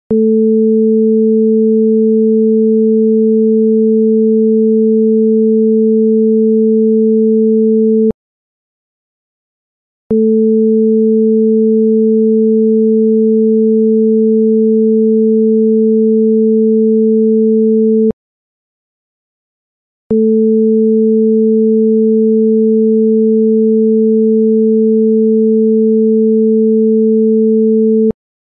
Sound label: 220Hz 440Hz